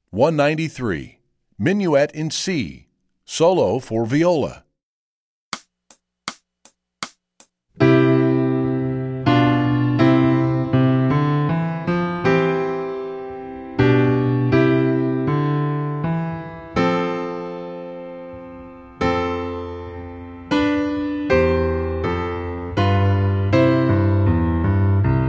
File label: minuet in c solo viola